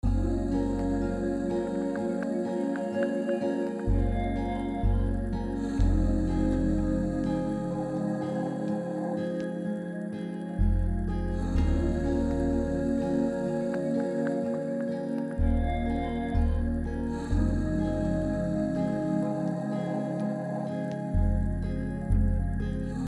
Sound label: BPM 125 PIANA; SMOTHING LOST